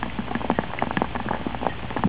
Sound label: rain